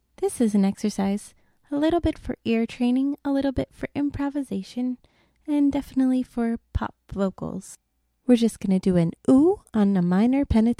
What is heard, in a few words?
Voice Warm up minor pentatonic